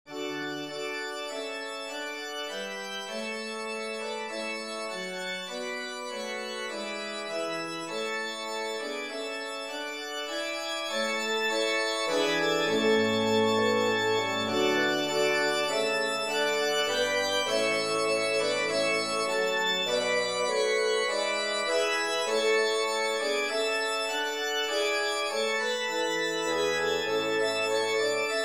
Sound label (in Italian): grand choeur, trascrizione da concerto per grande organo